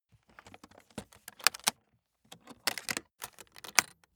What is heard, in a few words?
aluminium case open buckle